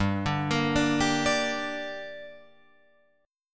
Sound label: G Minor G down Guitar Open F, chord; arpeggiated; JGuitar